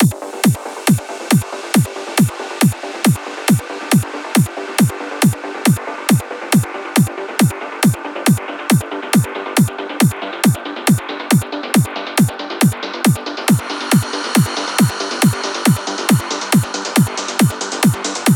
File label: noise remix